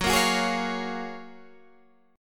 Gb Major 9th, down; GbM9; strummed; JGuitar

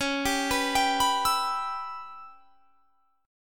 Db Minor 7th Flat 5th Db down, chord; arpeggiated

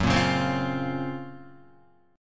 F sharp Major Sharp 11th F sharp, Guitar; F#M#11 strummed

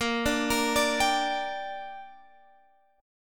A sharp 6th; A#6; arpeggiated; x,13,12,15,15,15

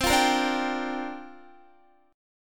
C 7th Suspended 2nd Suspended 4th C down Guitar; C7sus2sus4 strummed

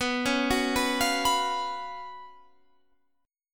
F sharp Major 7th Suspended 4th, B, chord

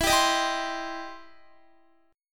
E Minor Major 7th Sharp 5th E down Guitar; EmM7#5 strummed

